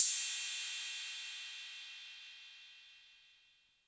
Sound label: Cymbal 808 Color